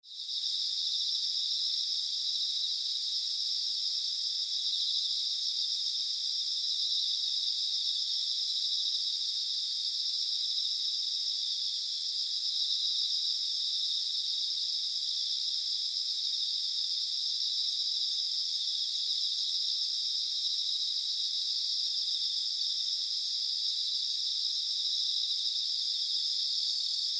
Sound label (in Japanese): mushi aki; 秋の夜中に鳴く虫たち・環境音・9月版; 基本的に近所で録音した音です。